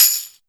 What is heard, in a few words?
TAMBRIN, TAMBOURIN; Big Beat Samples & More; AKAI